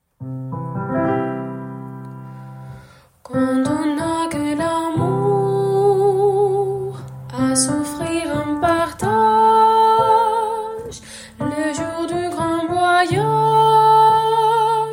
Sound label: sopran